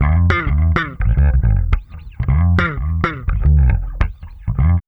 BASS, Funky Ass Loops; East West

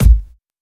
Kick, Drum Hits

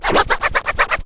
scratch fills; SCRACTH FILLS; Megabass Remix! 2

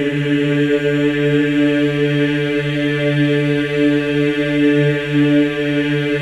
EES D4, MEN EES OCT, Symphony of Voices; Spectrasonics

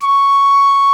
C#4 PICCOLO; Northstar; New Gold VOL-2

